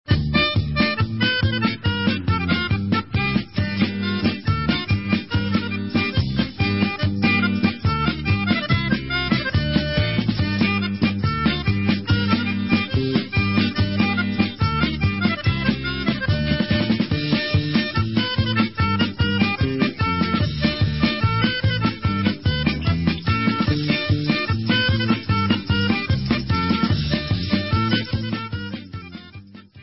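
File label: Instrumental, Israeli Dances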